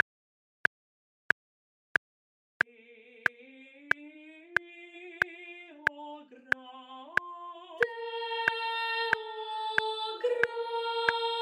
Soprano Click 92 Crotchet

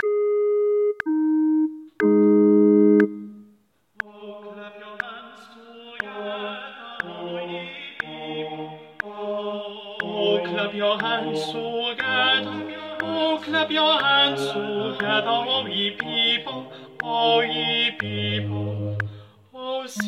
Rehearsal T1 T2; Gibbons

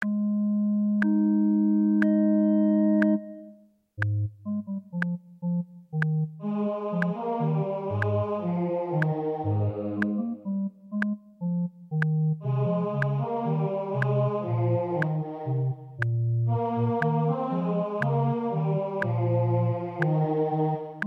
O clap your hands; Gibbons

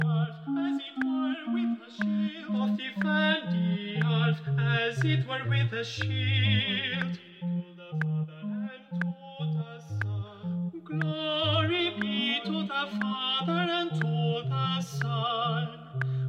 Rehearsal T1 T2; Gibbons

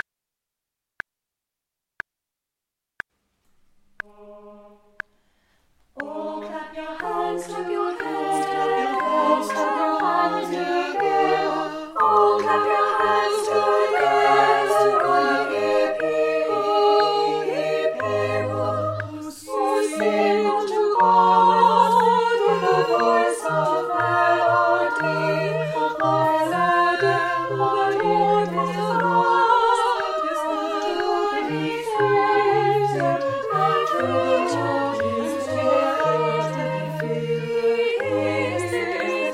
Rehearsal SSAA; Gibbons

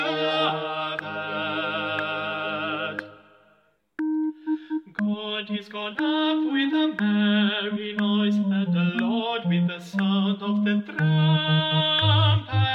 Rehearsal T1 T2; Gibbons

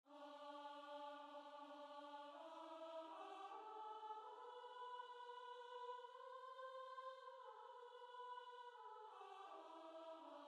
Mo Ghile Mear Melody; Choir; Harp; irish tunes set; SALAMANCA CHOIR SCORE

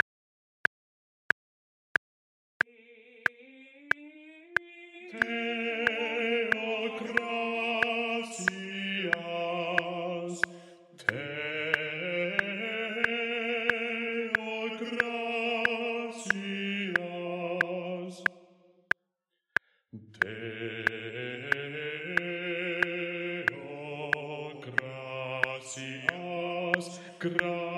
Bass Click 92 Crotchet

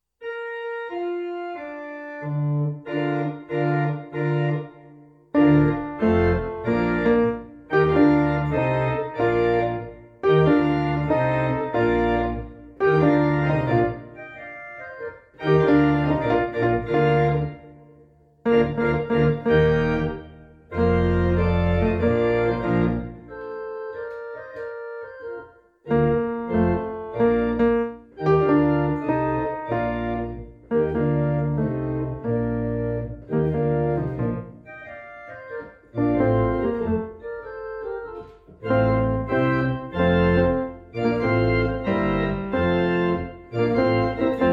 Tenor acc; Foundling Anthem Part 2

